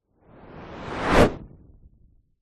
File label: whoosh fast; sound design